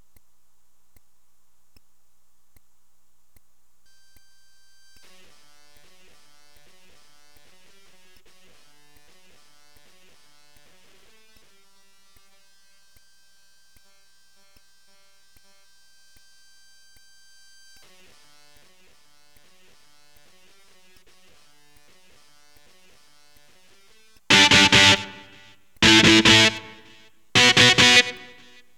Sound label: E GTR POWER CHORD